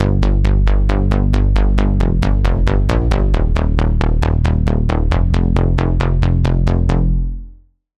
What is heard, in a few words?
Bass, Sylenth1; Routed Sound; Sylenth1 Expansion - Reality Maker Vol.1